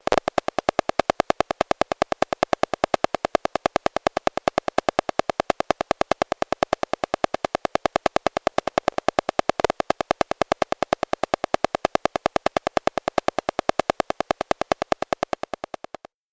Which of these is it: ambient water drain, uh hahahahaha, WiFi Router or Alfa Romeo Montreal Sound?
WiFi Router